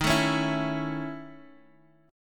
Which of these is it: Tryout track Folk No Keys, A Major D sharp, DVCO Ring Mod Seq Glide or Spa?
A Major D sharp